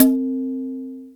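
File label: Open pandeiro, Roland MC-303 Percussion; Drum Samples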